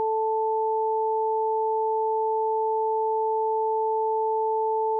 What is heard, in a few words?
stereo test